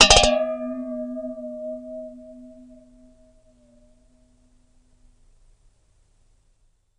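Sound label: Champagne Toast Double, glass clinks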